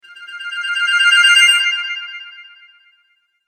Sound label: Bright Aura Spell, magic; Magic Sound Effects; fantasy sound effect